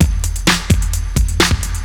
loop; SoundFactory; SMPMANIA

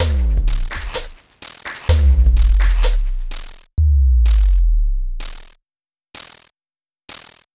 Electronica Drumloop; Drums

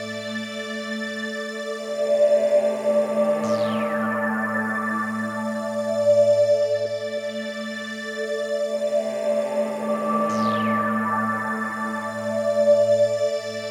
Pad, Synthesizers

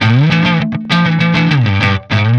Electric Guitar, Loops; Guitars

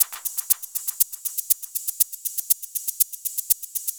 Hi-Hat Loop, Drums, Dance Beats

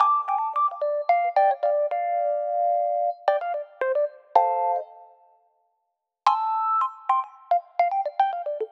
Electric Piano, Loops, Keys

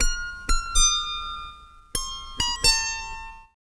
Strings

